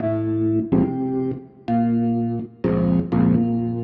DEEPHOUSE ORGAN 125 A

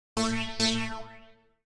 TEKNO 140, Techno; Techno2; Sphere

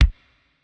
Kicks; Touch Loops; CosmicFunk

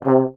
C2, French Horn Stacato; DirectWave; Varazdin Orchestral

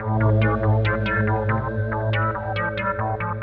SPACE SYNTH 140 A; SpaceSounds

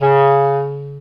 Alto Clarinet Portato C#2; VarazdinOrchestral

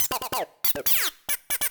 PERC LOOP INDST 140, Industrial

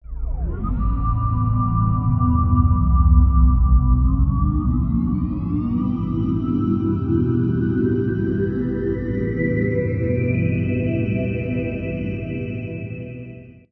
SPACE PAD 140 A; SpaceSounds

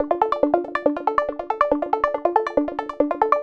140 Dm Arp Pop; Touch Loops; Textural Electronica Mini

